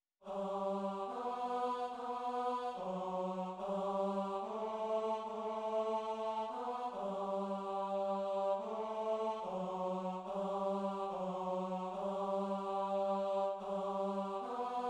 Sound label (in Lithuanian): TENOR; Viešpatie pasigailėk; Šv Augustino mišios